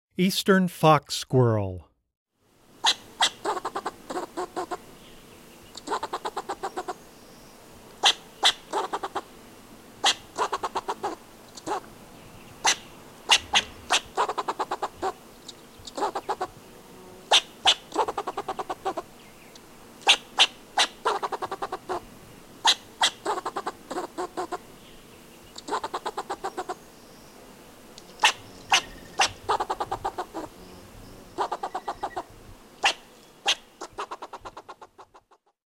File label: Fox Squirrel, Wildlife Sounds